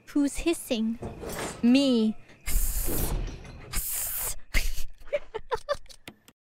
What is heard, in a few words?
hissing; who's hissing; noises